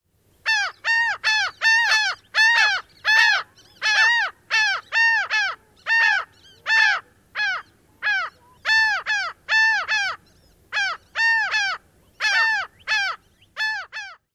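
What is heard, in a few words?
two calling back-and-forth, laughing gull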